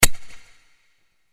closed, kartal

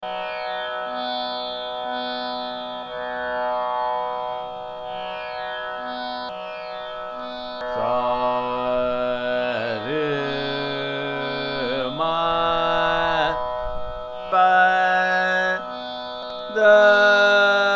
Adana Aaroh Avroh

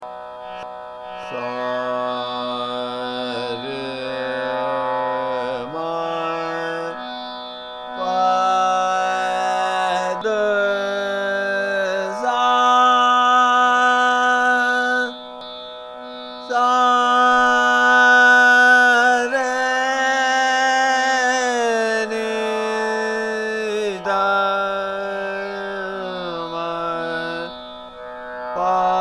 Komal Rishabh Aasawari Aaroh Avroh

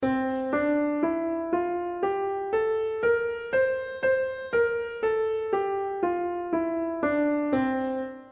Khamaj That Aroh Midi

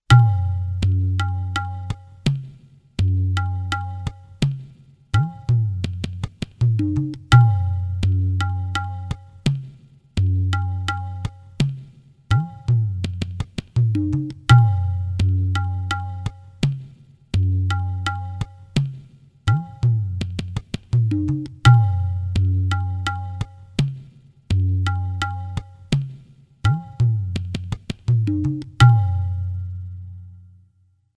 arjuna, tala

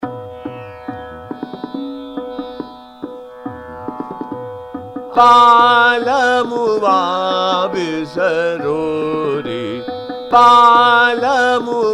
Chota Khyal - Ektaal Drut, Bihag; Balamuwa Bisro Ri

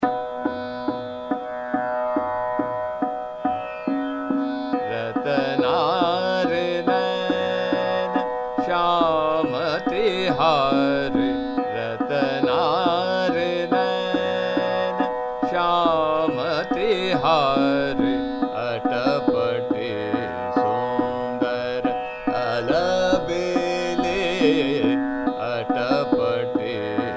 Chota Khyal, Trital; Ratnaare Nain Shyam Tihare; Yaman

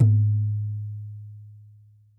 khol ga

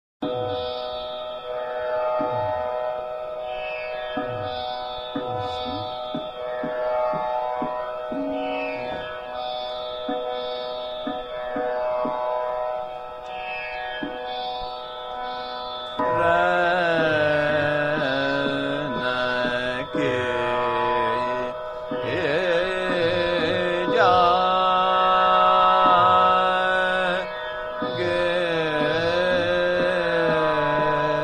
Bada Khyal, Ektaal Vilambit, Madhuvanti; Rain Ke Jage Ho Piya

